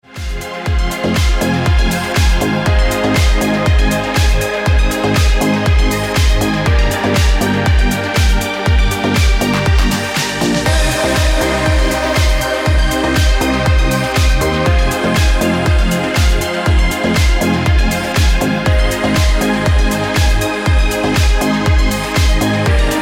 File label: Ringtone